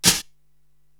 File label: SD Brush Tap, Korg TR-Rack drum machine